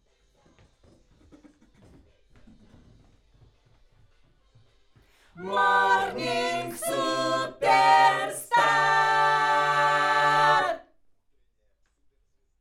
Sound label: Schoeps AKG, piano